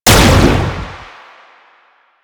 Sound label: Gunbrella Shot, Weapons; Warframe; Tenno; Koumei